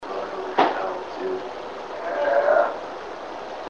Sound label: Evp Disembodied Yell